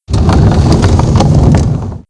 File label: Barrel Roll; Playable Tutorial Level